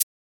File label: 808 symbol